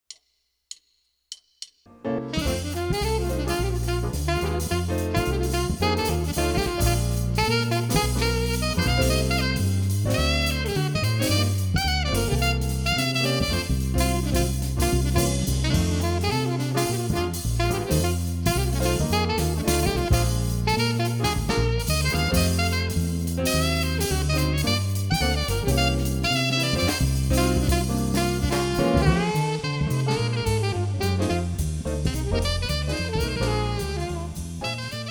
BLUES

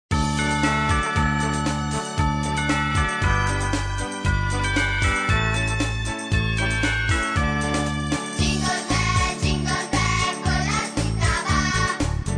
Jingle bell, Coro; Natale

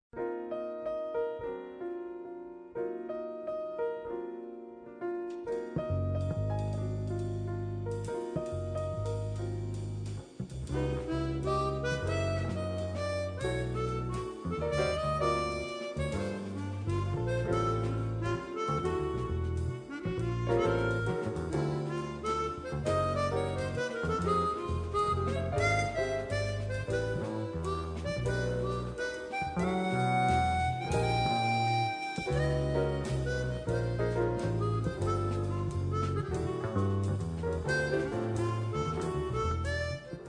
Jazz Waltz

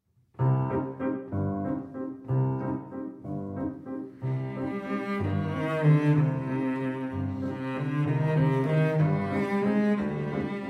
Waltzes, PIANO TRIO SUITE FOR VARIETY ORCHESTRA; SHOSTAKOVICH

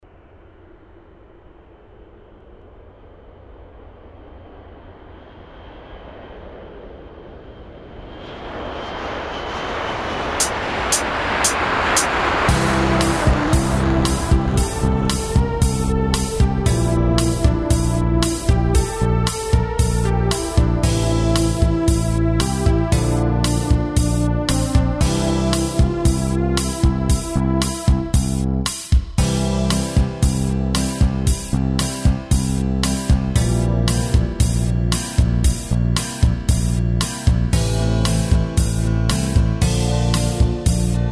Super jet (without melody)

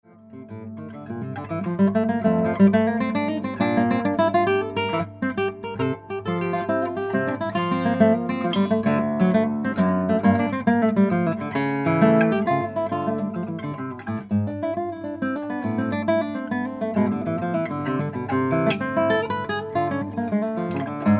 Corrente, Partita n. 2 BWV 1004 for violin; Johann Sebastian Bach ( 1685- 1750)

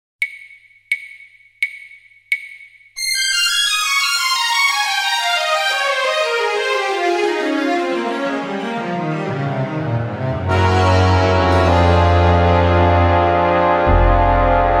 without melody, Basi Musicali

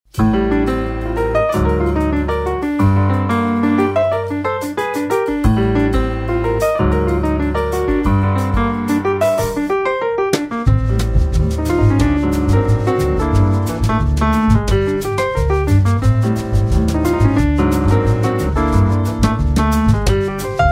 Bossa beguine

